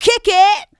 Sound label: Female Vocals; Houseworx